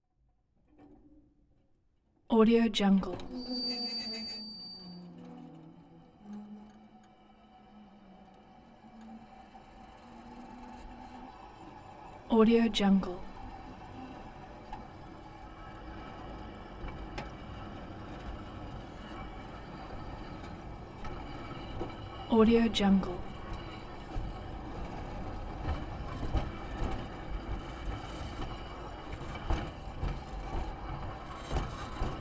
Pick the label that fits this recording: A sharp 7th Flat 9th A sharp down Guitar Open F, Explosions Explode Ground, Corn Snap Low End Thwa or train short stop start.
train short stop start